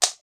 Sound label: item misc; RPG